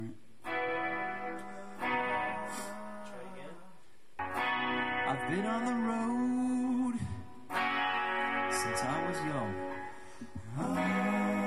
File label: Intro Harmonies; vocal practice